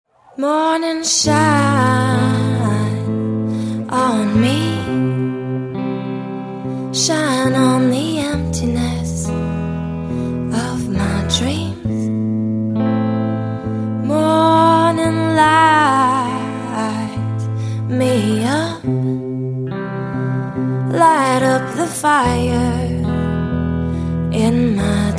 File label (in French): chant